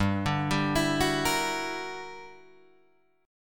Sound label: G Minor Major 13th, G, Guitar, DADGAD; GmM13; arpeggiated